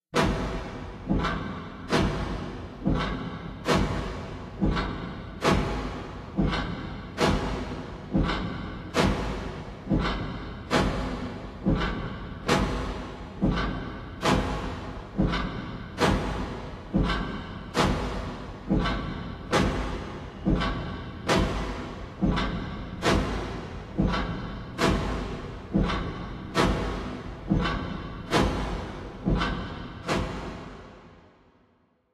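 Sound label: Ambient Hell Loop; silent hill homecoming 2008 gamerip pc ps3 x360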